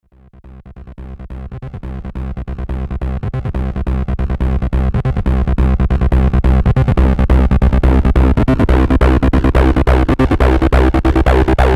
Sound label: roland v-synth xt 303 acid; TB: Roland V-Synth XT (with TB-Filter) played with internal Arpeggiator